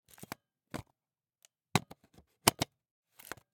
Camera, Pentax KS2, DSLR, mechanical, removing lens, replacing lens, twist, unlock, lock, XY; Eiravaein Works; Vaeyan IV